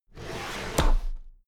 drawer cabinet paper open heavy

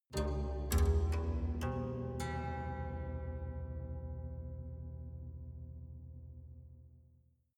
haunted strings sequence low soft

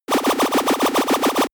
arcade play

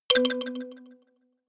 notification kalimba delay octave high

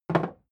hammer wood put down

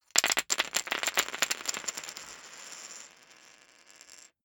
poker chips drop

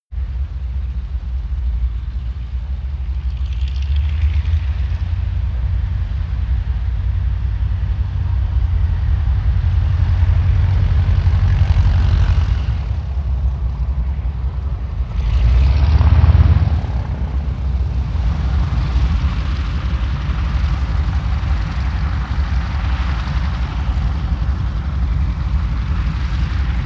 propeller plane ju52 maneuver medium very distant

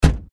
car door close

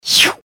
human shium

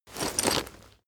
leather metal handling short

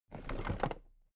toolbox raise heavy

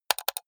computer mouse left click double hard

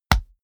combat chest hit

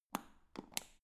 power button turn on off

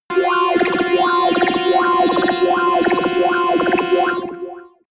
alien synth

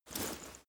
leather metal movement soft slow single

